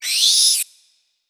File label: Rats; Legend of Keepers